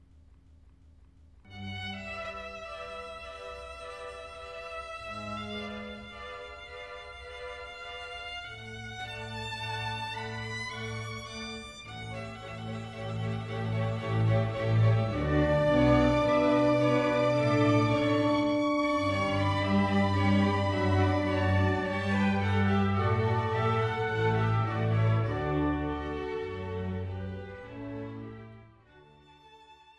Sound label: Violin Con D